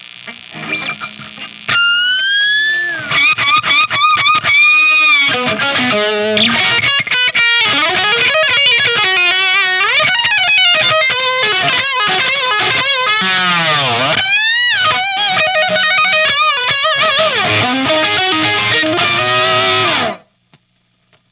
solo G12M 25; 12000 series metroamp

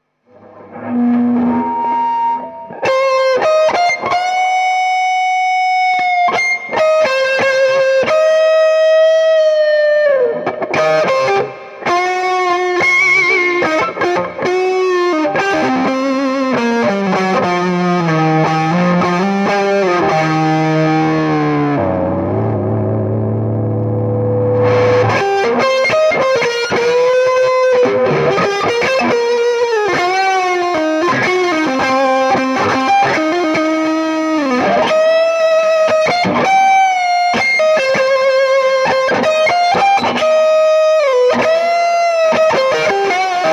einstein

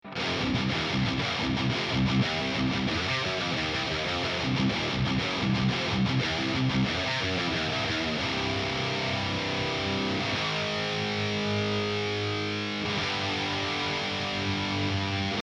amp; huge amp shootout

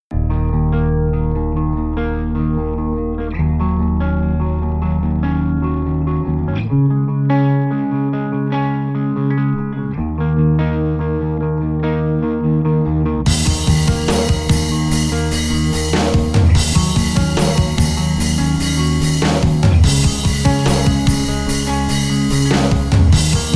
vh4 nosolo; rivera silent sister iso cab

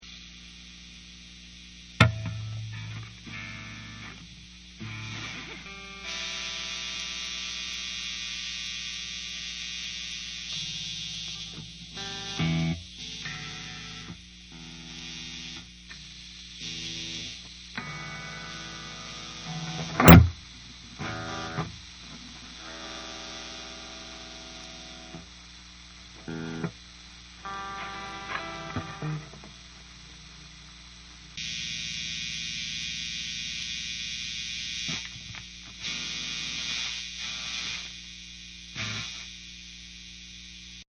marsha50 noise, idle noise and hum marsha 50 watt

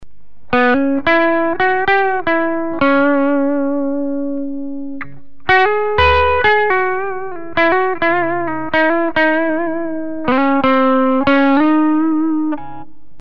koch line out

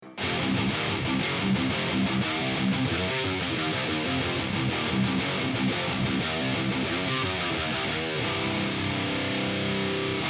avenger, amp; HUGE AMP SHOOTOUT

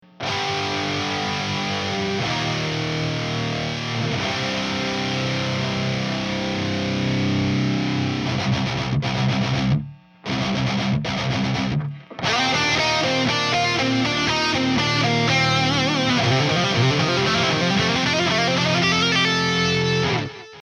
amp; amp shoot out mesa krank diezel mako peters

